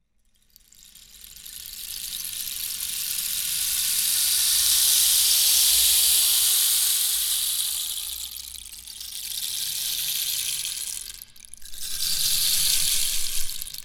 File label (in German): regenmacher regen; creaktor; mozee